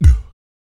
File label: BASS BOMB; Spectrasonics Vocal Planet